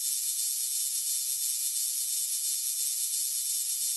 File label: laser